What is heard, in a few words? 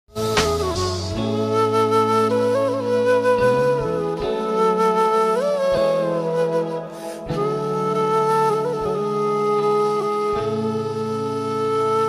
flute bgm sad flute ringtone; sad tiktok poetry music shayari